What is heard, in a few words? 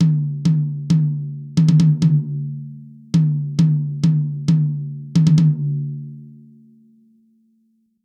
TG I51 Tom High